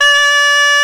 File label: gralla D5; SoundBytes - Early Patches